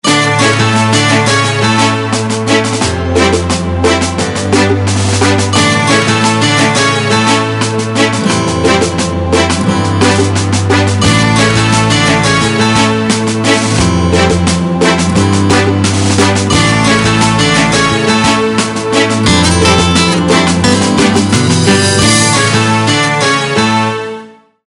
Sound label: Klassik; Swisscom